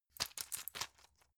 plastic blister handle

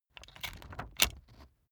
puncher operate slow

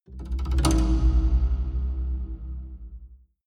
haunted strings roll low

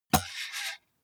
computer mouse draw drop hard

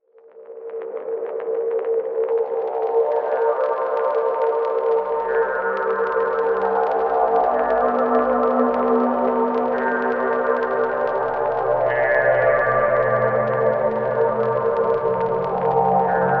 scary cosmic drone

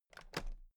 toolbox latches open regular

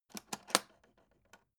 alu case small open

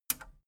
metal button radio release enable

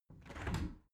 window open regular

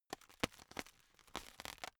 plastic pressured rustling slow

